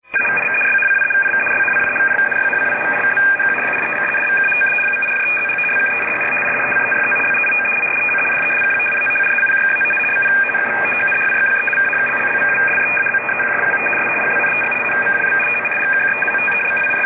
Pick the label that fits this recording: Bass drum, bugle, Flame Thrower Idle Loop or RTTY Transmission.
RTTY Transmission